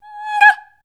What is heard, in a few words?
G#5, Voice Spectral